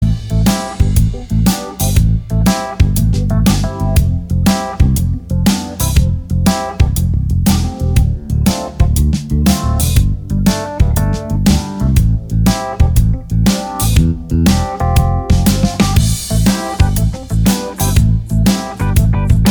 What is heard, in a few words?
uptown loop